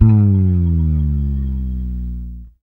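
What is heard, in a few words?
6-string fretless bass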